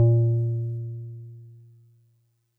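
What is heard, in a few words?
DigiPianet DX100 A#6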